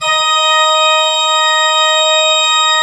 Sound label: D4, Cathedral Organ; ILIO; Propeller Islands Cathedral Organ